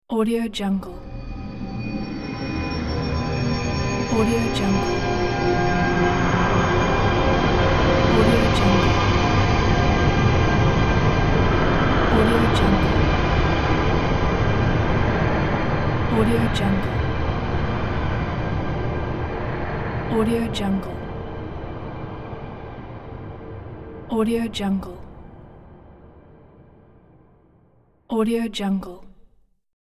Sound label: dark beginnings magic horror transition